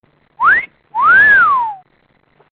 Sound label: Msg Tone